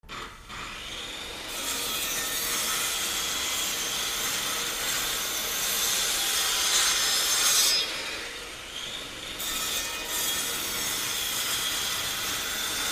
Power Saw Exterior Me, saws

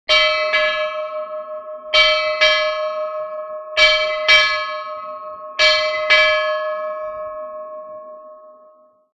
Ship Bell Double

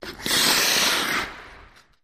Ice Skating Stop

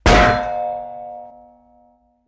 Metal Object Drops, pots & pans

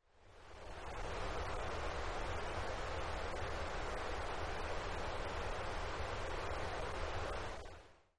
Fan Kitchen Stove; fans

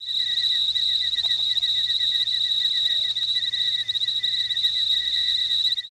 Cicadas Continuous

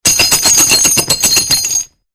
Machine Gun Burst Shell, eject shell

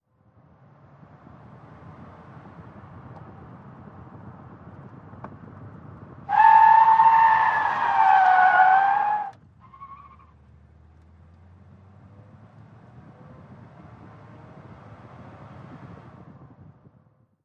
Sedan 180 Skid Mix

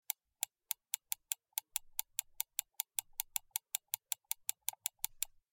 Highpitched Mouse, mouse

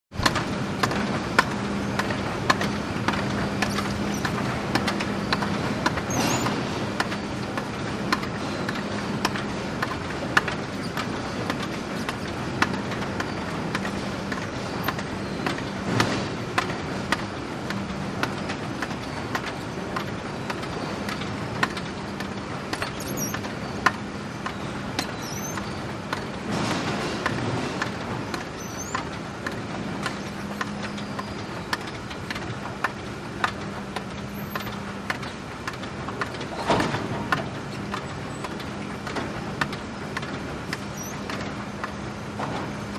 Conveyor Belt Factor

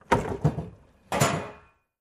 Barn Door Pounding Cu

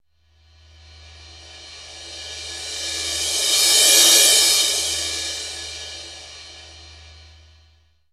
Cymbal Whoosh By

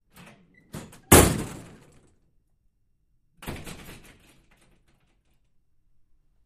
Door Push Bar Wood

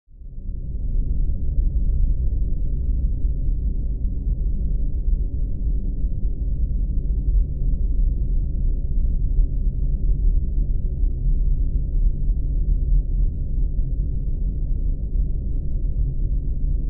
Ambience Lunar Cave

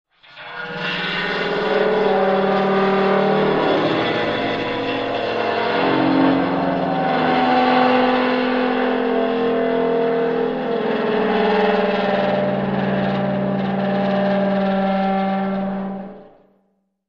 Dry Ice Eerie Metall

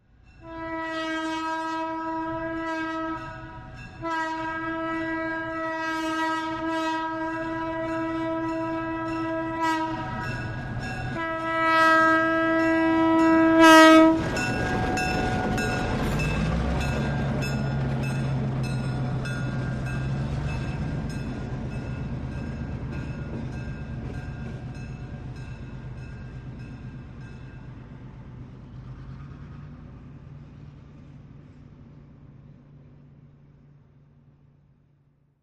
DISEL TRAIN PASSBY, diesels

